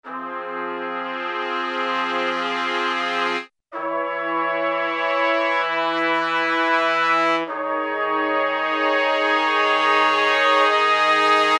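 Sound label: Brass Trumpets Swells; Brass And Woodwinds; Roland L-CDX-03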